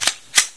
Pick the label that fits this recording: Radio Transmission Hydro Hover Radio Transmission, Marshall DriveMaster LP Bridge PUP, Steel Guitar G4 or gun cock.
gun cock